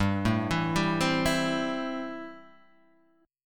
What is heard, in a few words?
A-Minor 7th G